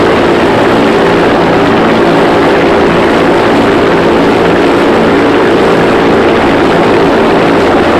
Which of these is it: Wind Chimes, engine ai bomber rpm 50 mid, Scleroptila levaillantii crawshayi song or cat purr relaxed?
engine ai bomber rpm 50 mid